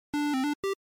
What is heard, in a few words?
beep intro; Araldia